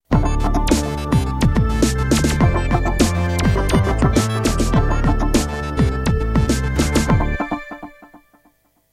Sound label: Jingle